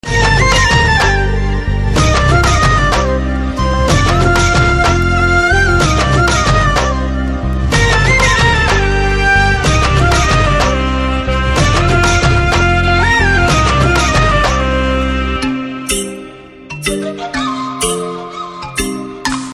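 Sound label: background music Telugu Ringtone; Narappa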